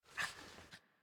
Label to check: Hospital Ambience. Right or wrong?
Wrong